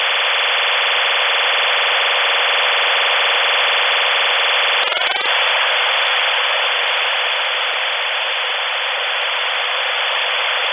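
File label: OFDM 128 idling traffic; RUS; 3kHz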